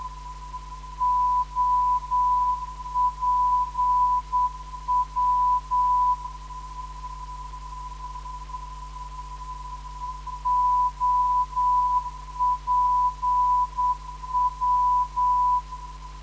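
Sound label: non-directional beacon; OPW; 267.5kHz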